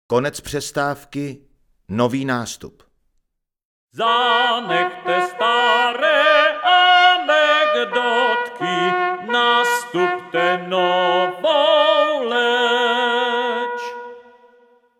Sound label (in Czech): Konec prestavky; desatero